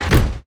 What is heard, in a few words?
cargo wagon close; factorio 2.0.55